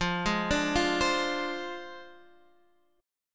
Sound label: Ab 6th Flat 5th F